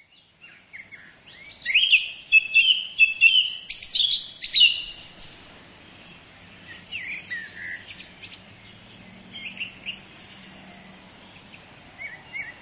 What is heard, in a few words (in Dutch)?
zanglijster